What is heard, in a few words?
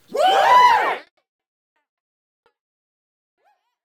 short woo